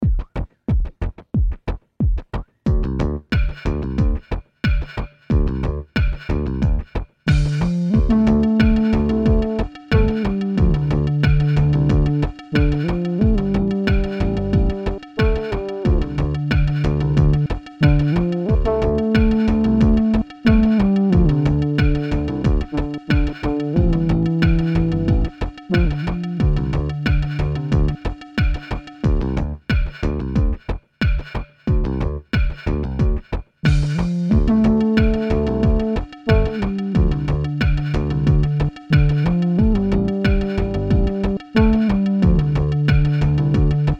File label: this old hammer zonder zang